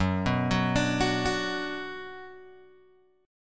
Gb Suspended 4th Sharp 5th Gb, down, Guitar, Open G